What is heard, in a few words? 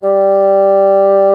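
SOFT BASSOON G4; Northstar - New Gold VOL-2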